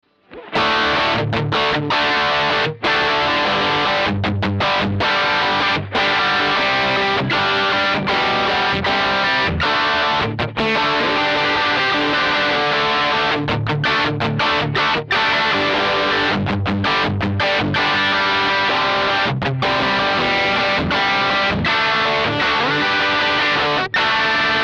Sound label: vox valvenergy mystic edge